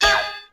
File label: hit metal